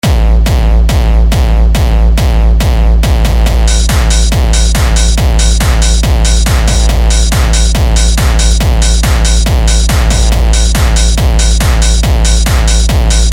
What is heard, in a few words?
HardCore HardDance